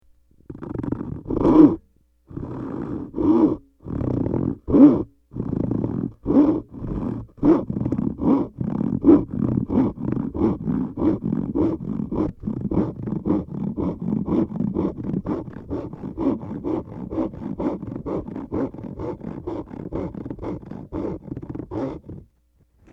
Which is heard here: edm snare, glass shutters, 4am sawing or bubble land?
4am sawing